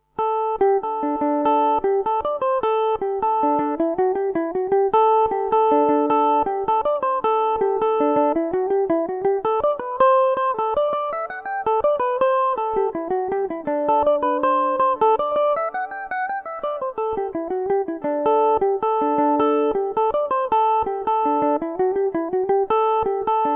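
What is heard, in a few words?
D mixolydian, irish mandolin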